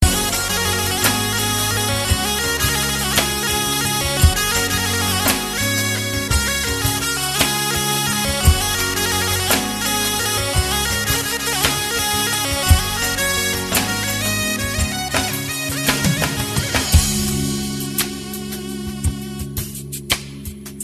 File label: Irish Flute